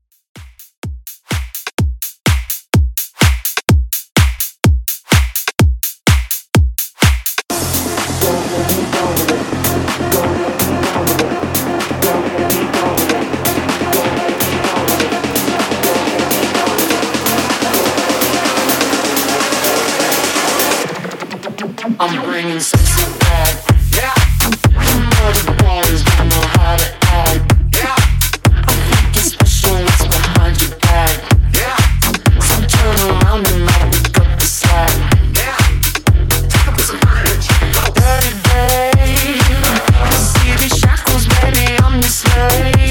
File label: Bass House 126Bpm